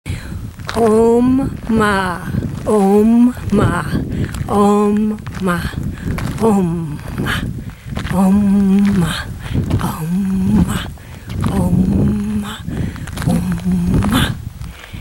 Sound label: om ma while walking